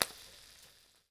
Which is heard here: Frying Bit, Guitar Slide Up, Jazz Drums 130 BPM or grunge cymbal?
Frying Bit